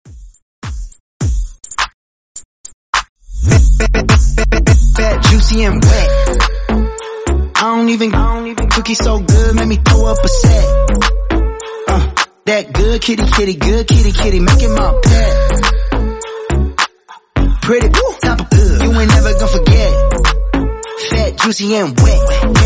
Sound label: Intro 104 BPM